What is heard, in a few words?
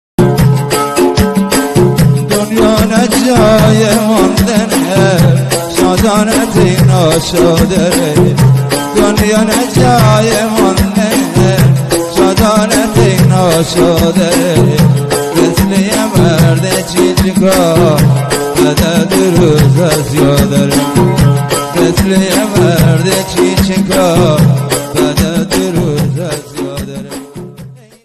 Bandari